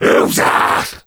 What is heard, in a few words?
groan, zombies